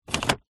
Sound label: vehicles car fan mode dial turn toyota rav4